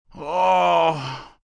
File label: Moan Woeful, Moan, groan, male, hangover moan, short; Groaning; (human voice)